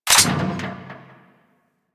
Crew Ship Turret Mech; Warframe; Vehicles; RailJack; Crew Ships; Grineer Crew Ship; Turrets

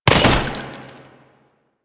Ammo Bounce; DE; Warframe; Warframes; Frumentarius; AmmoAbility

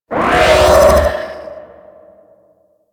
Ability Cast; Warframe; Warframes; Rhino

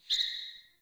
Sparrow Death State Single Chirp; Animals

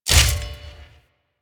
Garage Door Close; Ambience; Warframe; Venus Open World

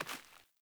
Sandals Walk Vegetation, Footsteps; Characters; Player

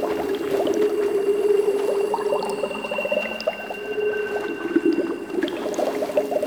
Maw Fishing Little Fish Loop; Duviri

